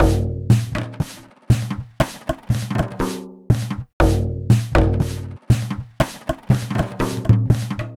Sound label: Frame Drum Loop, Ethnic Instruments